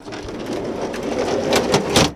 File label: Plane P40 Canopy Open; Airplanes